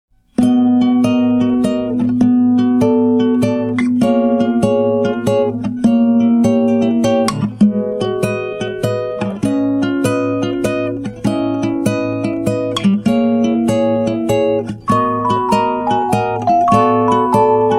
vinheta

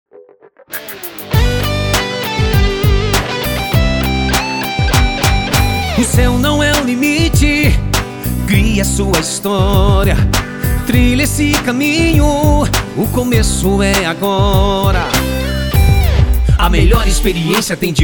Jingle: Agência KMC - Comercial

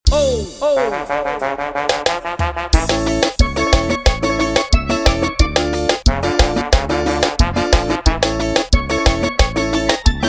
forro piseiro

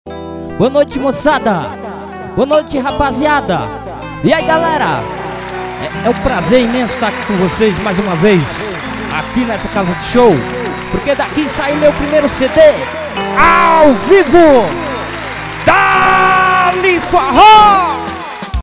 vinheta

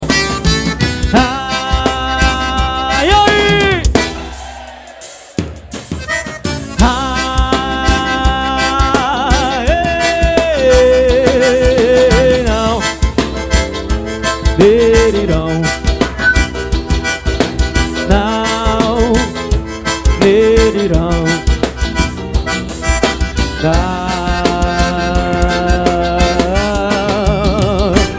forró; Manaus AM

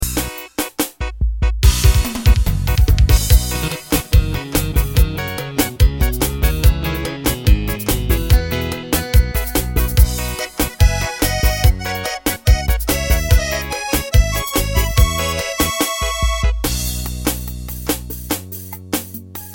ritmos korg pa500 arrocha

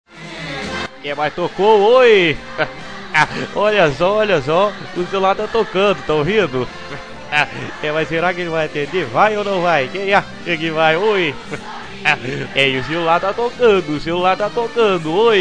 toque para celular

